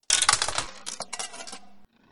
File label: Insert Coin